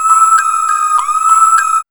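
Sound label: synth sequence; E-Lab; X Static Goldmine 1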